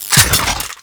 Air Pistol Reload; Warframe; Corpus

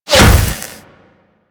Glass Unbreakable Impact; Warframe

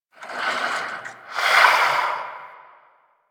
Vocal Breathe; Warframe; Duviri; Dax; Vocal Set A

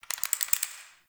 Cave Shrine Critter, ambience; Midrath Island

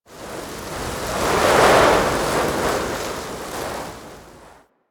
Forest Wind Fast Gusts; Ambience; Warframe; Grineer Forest

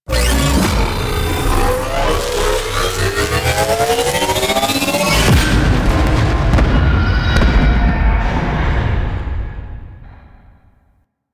Transition; Warframe; Jackal Redux

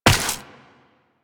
Step Ice, Footsteps, Walk; Warframe; Tenno

